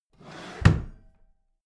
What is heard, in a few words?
empty drawer smooth close heavy